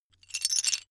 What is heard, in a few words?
metal pieces clatter short